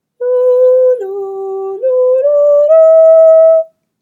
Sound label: Echo Pattern